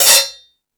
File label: Discoball, Drum Machines E-MU Pro-Cussion